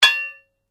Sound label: Blade Heavy Impact, Sword; Foley sounds for melee weapons; Combat